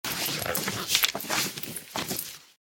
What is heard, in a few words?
fabric wipes; soundscrate